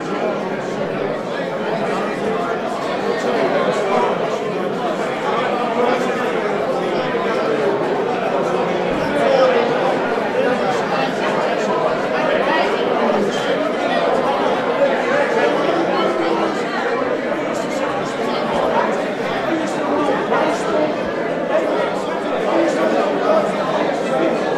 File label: ambience bar large busy